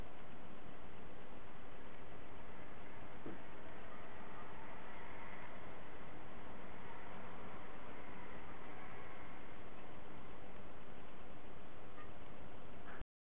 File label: Kids Sounds